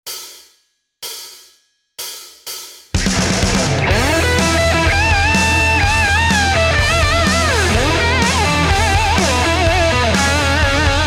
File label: Blues, Guitar Techniques; Guitar Techniques 2019 Issue 297 July